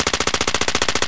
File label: kedr loop silenced distant; Escape from Tarkov